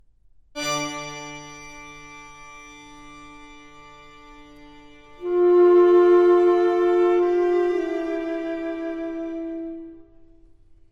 Quintet For Alto Saxophone And String Quartet - quarter note=68